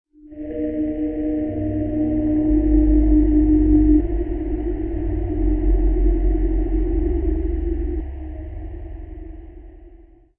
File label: wind pad; himalaya